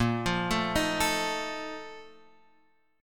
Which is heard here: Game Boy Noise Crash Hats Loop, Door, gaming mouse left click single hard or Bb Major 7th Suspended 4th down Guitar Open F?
Bb Major 7th Suspended 4th down Guitar Open F